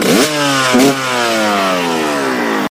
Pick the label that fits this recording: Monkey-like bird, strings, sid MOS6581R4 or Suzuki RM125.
Suzuki RM125